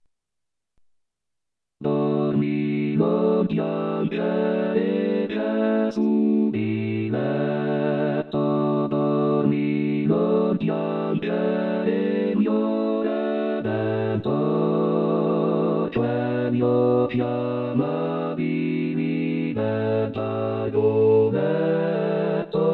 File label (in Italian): Coro; Dormi Non Piangere; natale